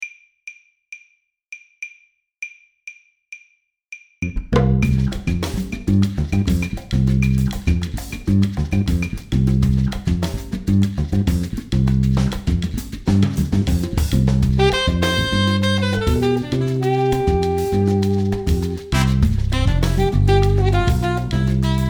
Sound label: cuban son